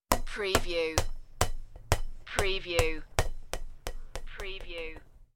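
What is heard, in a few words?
Bouncing Ball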